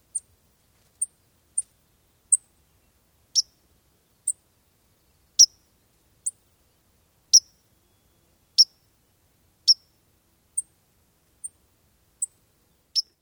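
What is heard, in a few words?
White-throated Sparrow call and alarm; bird language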